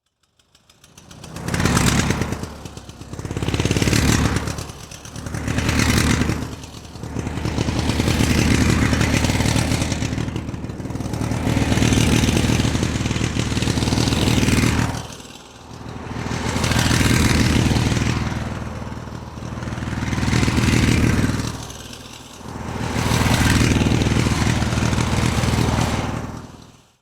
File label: motor running